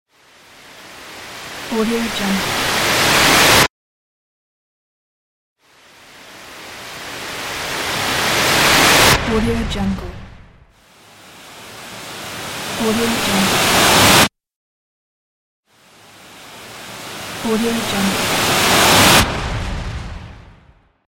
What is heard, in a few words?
riser